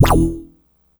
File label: Synth Hit E